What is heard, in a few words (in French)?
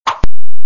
texture fouet